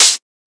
Closed Hat

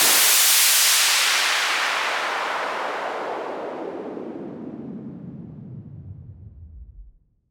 Fall Clean, Falls

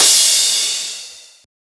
Crash

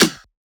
Perc, Percussion; FLPRO

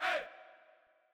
Chant

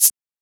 Shaker

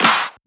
Shifted Clap, Claps; FLPRO

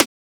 Transient; FLPRO

